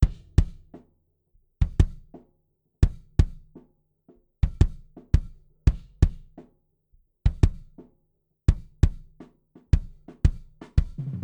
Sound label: debleed kick ON